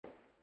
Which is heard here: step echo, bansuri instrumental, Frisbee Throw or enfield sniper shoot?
step echo